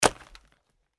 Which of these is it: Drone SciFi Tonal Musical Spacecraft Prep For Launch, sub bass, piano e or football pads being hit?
football pads being hit